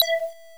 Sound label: mine arm; Station-0